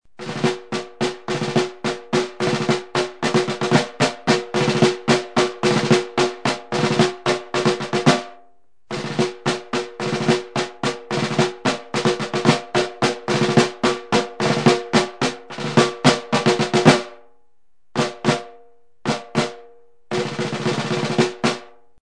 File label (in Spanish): tambor militar toque